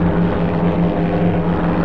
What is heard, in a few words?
bomber; Worms3D